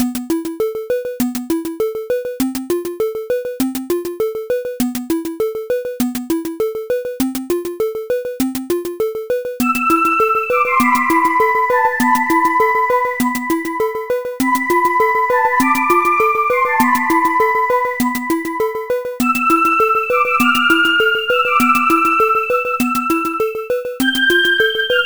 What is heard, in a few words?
Story Middle Bgm; Adventure in the Tower of Flight